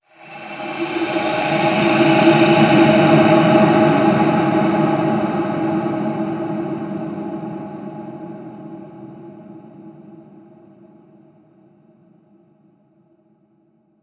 environment graveyard stinger ethereal

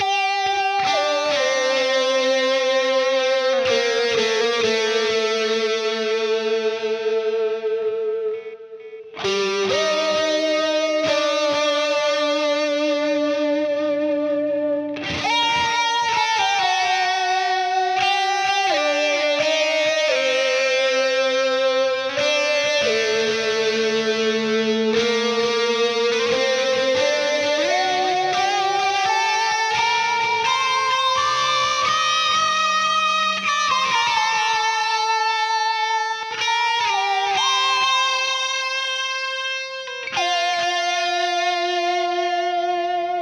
Reverse Layer (Guitar); The Binding of Isaac Repentance; Genesis II Reverse Downpour Layer (Guitar)

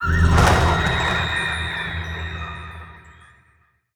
Skill1 Magic; portal quest android; Dumbledore

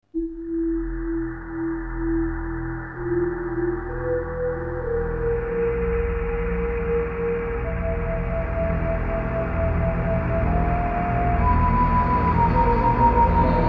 glittering caves; tenchu 2 birth of the stealth assassins

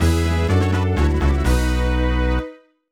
Level Up Fanfare; Pokemon Ranger Shadows of Almia; Nintendo DS

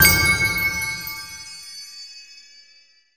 Stars Sparklerays; portal quest android

